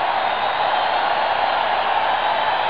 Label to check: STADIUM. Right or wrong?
Right